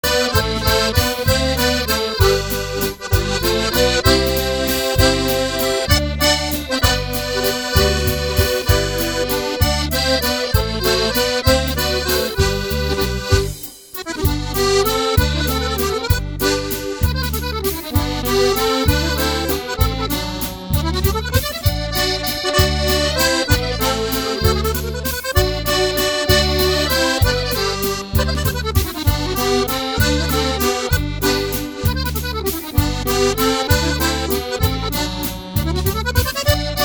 Waltz Medley

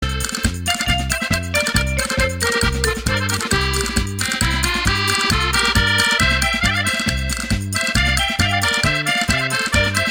Paso Doble

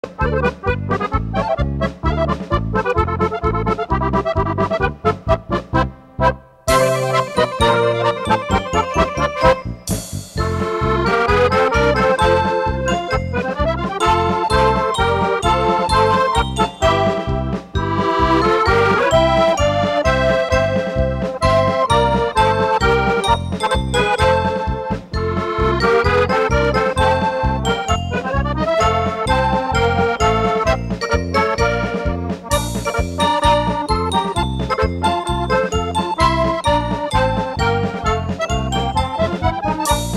Polka

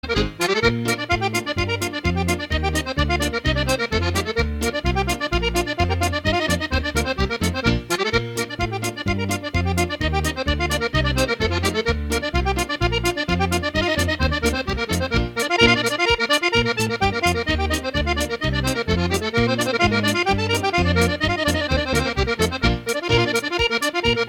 Reel Collage

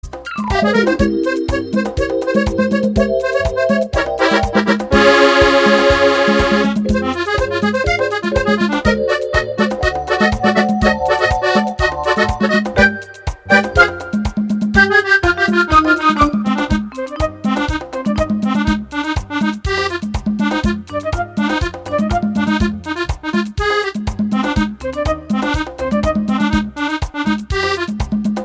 Samba

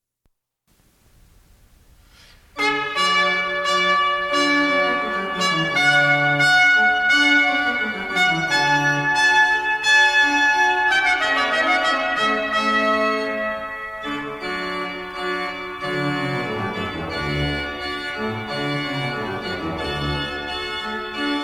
Fanfare; Water Music

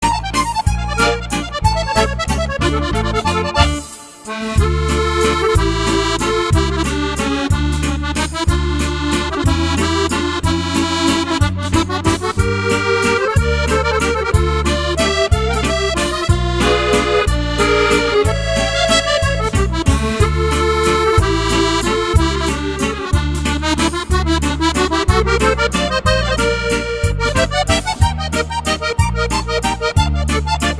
Waltz

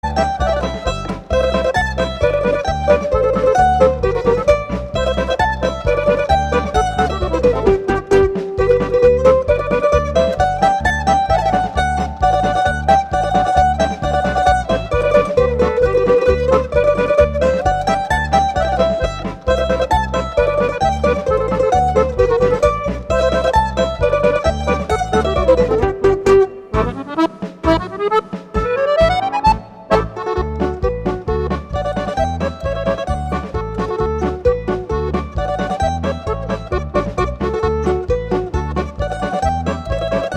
Mandolinata

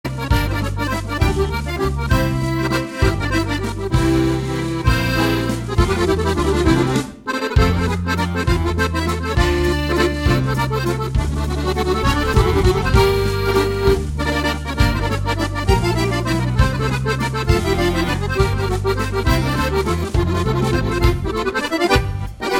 Valse Francaise

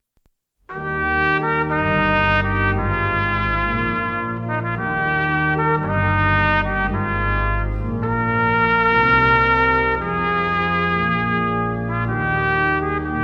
Air from Water Music

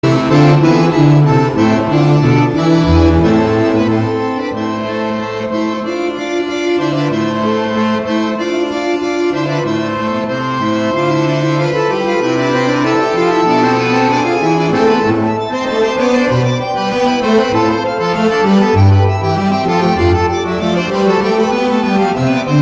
Organ Concerto No 2 in Am

